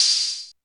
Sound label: CR 78 KIT